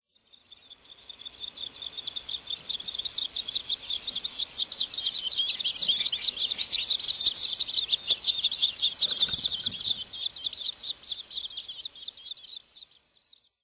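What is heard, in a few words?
bull-headed shrike, chikuma; mozu; koe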